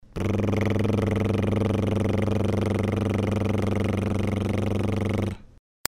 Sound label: lip roll